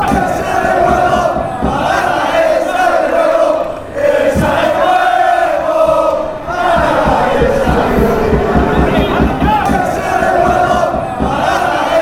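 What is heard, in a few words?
break, football songs and soccer chants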